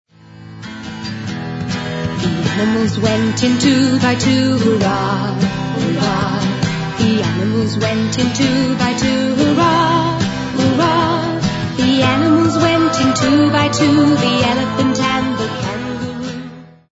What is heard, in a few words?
children's choir; st john's